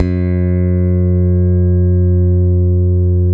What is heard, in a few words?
PRECISION BS G 3, Bass; Spectrasonics; Bass Legends